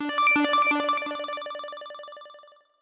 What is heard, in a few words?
Tread Heavy (Victory); Shuttle Rush; Wii U